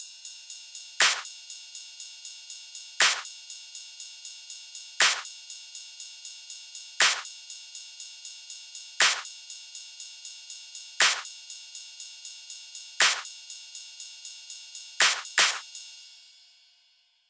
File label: DARK BELLS DRUMS NO KIK